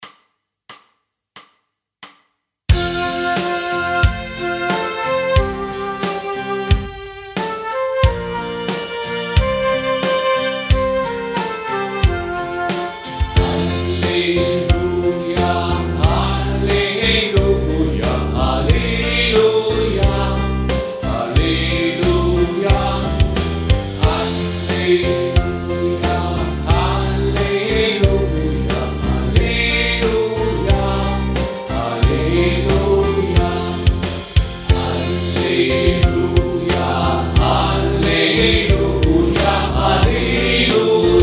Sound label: halleluja